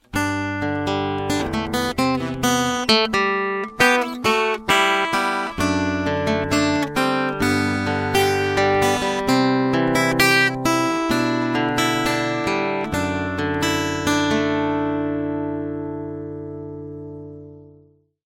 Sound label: piezo, guitar